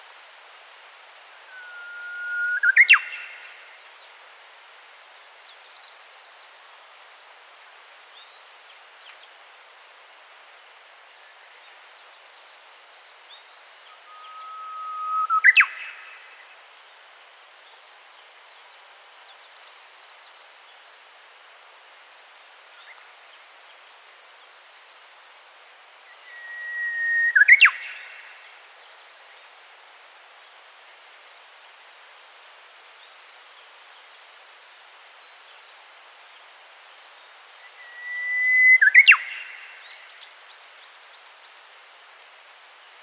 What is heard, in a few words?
Cettia diphone